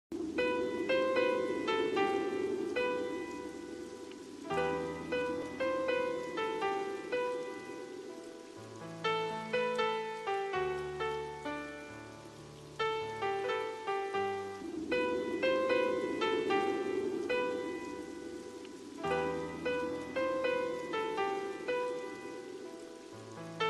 instrumental ringtone, slow motion ringtone

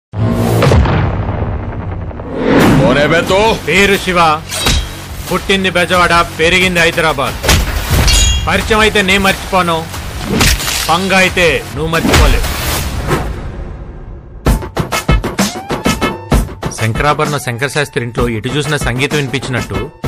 movie bgm ringtone telugu bgm ringtones; aaradugula bullet

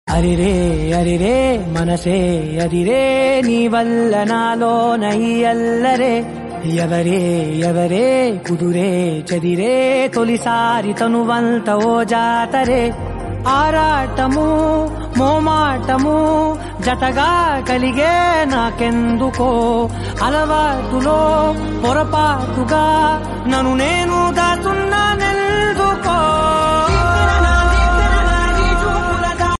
telugu song ringtone

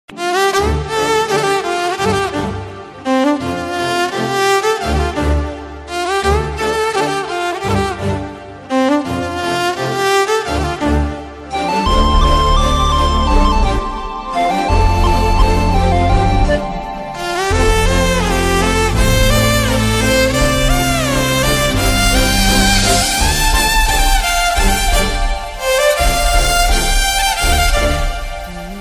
love bgm ringtone, telugu; ardhashathabdham movie

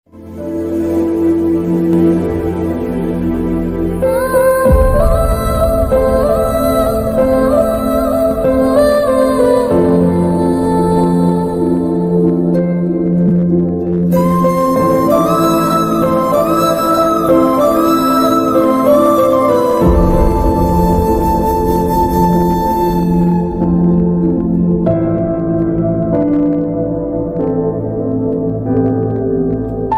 tamil love bgm ringtone; mr majnu

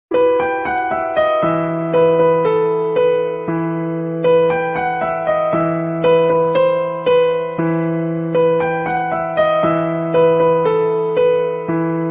best old instrumental ringtone old is gold

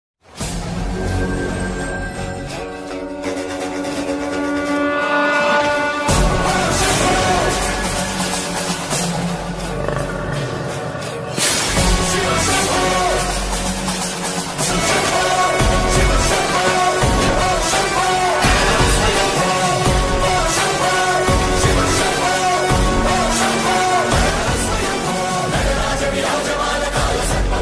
song bgm ringtone telugu; Akhanda

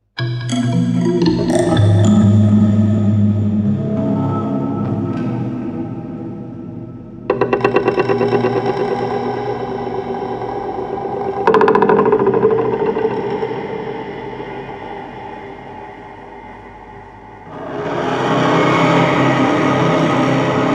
bgm instrument; manjummal boys

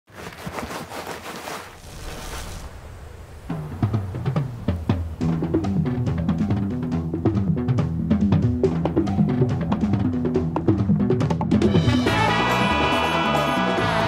bgm malayalam; malaikottai valiban

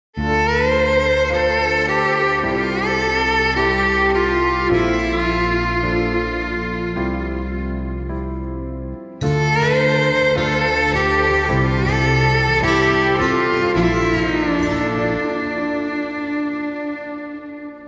Pathos Violin Ringtone

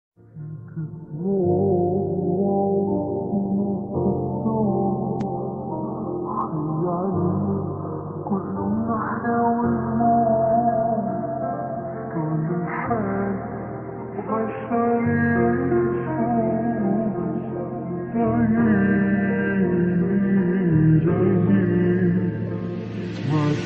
romantic ringtone love ringtone hindi ringtone

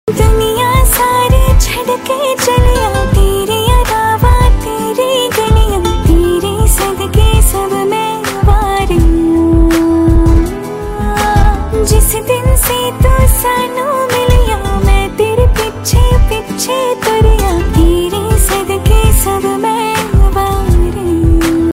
ringtone female version

